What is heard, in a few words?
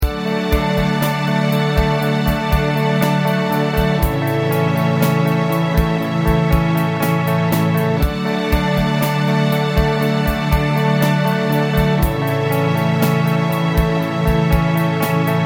Slow Clean